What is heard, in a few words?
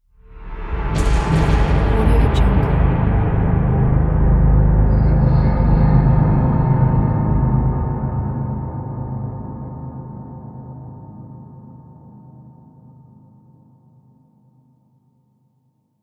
dark demonic stinger